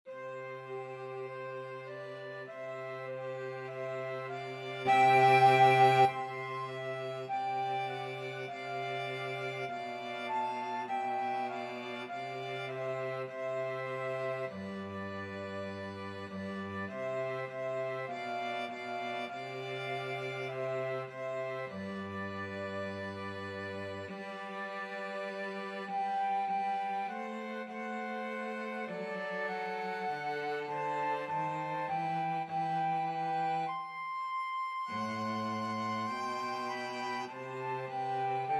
flute violin cello

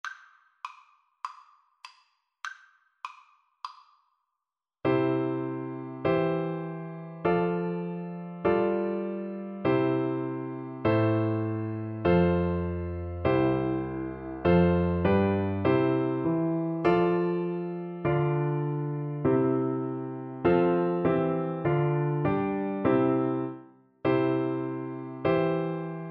Oboe

